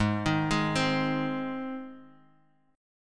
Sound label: Ab Major Ab, Guitar DADGAD; down